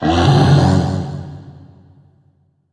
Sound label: bear spawn